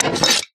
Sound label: wrench pickup; Items handling